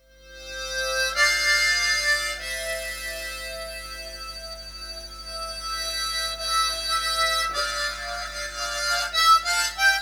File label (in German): harp Konzert